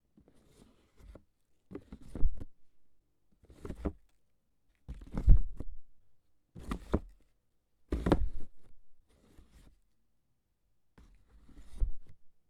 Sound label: Opening And Closing Of Shoe Box